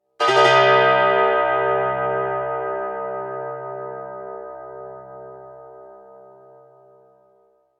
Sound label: bring the drama, message tones